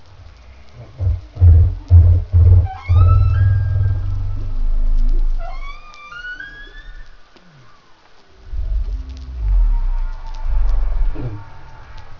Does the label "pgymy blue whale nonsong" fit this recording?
Yes